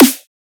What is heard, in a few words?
Snare Dubstep; Trap and Dubstep